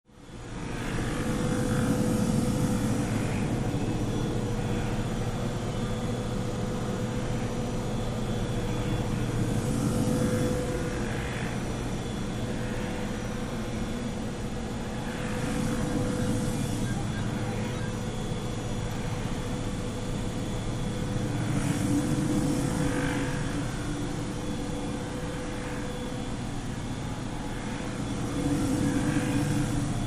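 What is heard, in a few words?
Spectrometer Machine; mulcher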